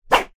Caesar Salad Thrown

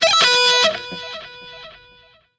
Guitar

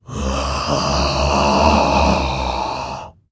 Dino Groan

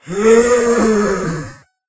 Future Groan

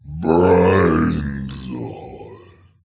Pirate Groan

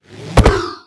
Raptor Kick

